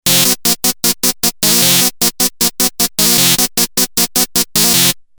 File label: altair buzz